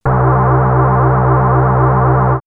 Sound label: Machine Hall, synth fx; StarTrek; X-Static Goldmine